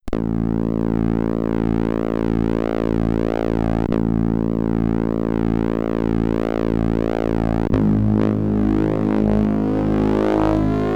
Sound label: SID Stereo; katakis; commodore 64